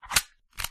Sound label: Pistol Wield